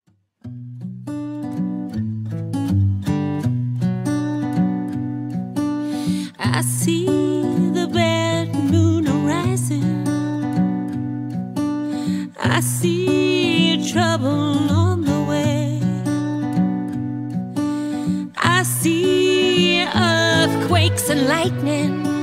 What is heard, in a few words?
Campfire Sing-Along